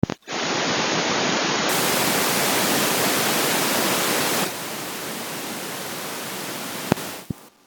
Microphone Static

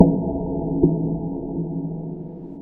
Horror Impact Stinger

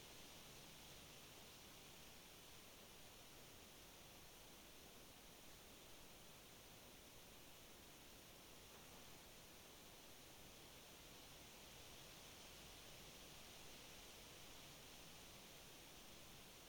Autumn Forest Wind

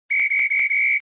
Multiple Whistle

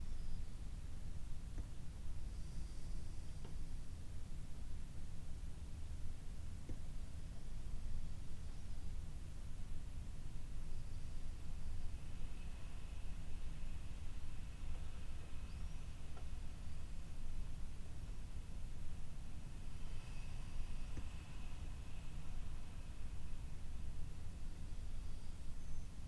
Room Fan Raindrops

